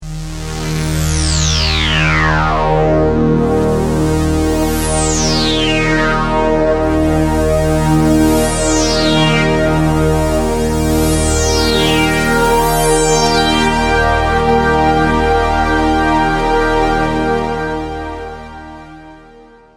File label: SYNTH Sweep; Sweep My Band